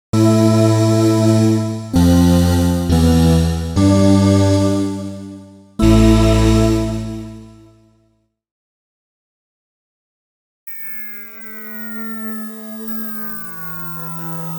yamaha ex5r sounds, fdsp ringmod, choir raw and ringmod